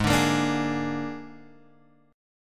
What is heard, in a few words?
G sharp Suspended 2nd Flat 5th G sharp, chord; G#sus2b5 Chord {4 5 X 3 3 4} strummed